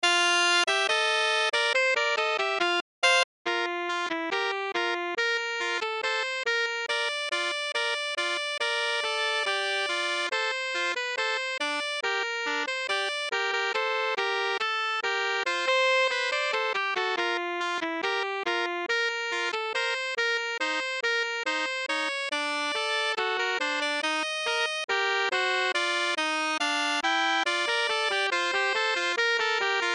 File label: Sax Duet